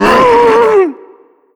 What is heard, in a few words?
pain, zombie vox; creatures